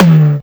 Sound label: Toms